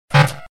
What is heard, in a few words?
Keno BOOP